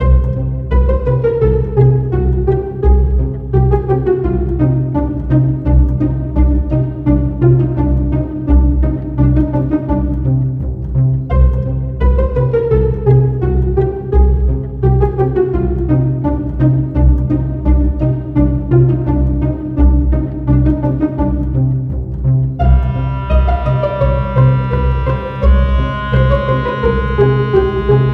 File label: Pizz; Comic 드라마